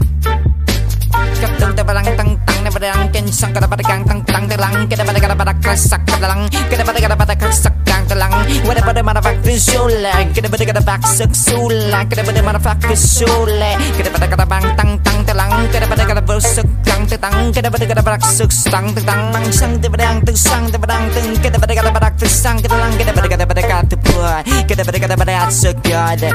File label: rap, hip hop ringtone